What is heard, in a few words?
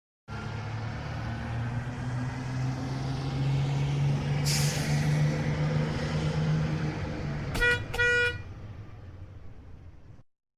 bus horn